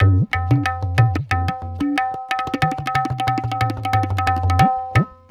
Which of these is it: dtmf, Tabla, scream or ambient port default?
Tabla